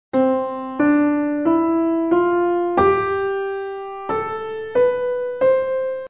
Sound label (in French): Gamme do majeur